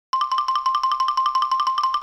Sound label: Cartoon tiny walk